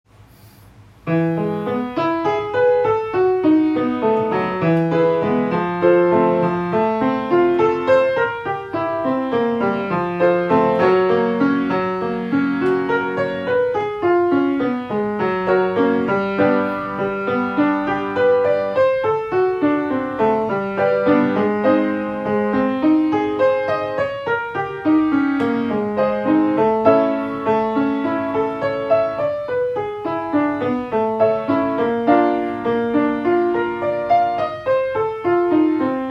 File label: Long Scale 1 1/2 Octave